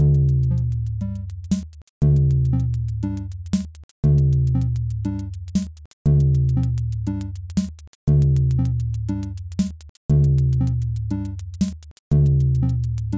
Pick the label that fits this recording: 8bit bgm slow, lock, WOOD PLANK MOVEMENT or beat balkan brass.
beat balkan brass